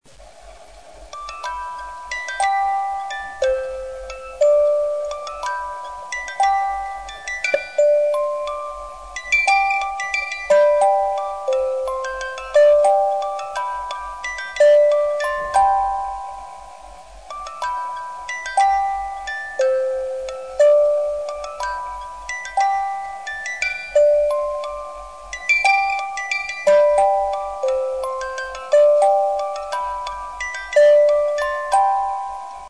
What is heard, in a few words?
amazing grace, quartz clock movement; quartz clock movement accessories